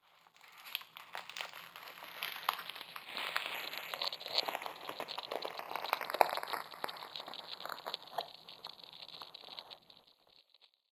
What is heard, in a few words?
interactions dot long; marie eve levasseur